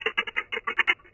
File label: rattle D